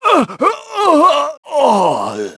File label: DYING; battlesnds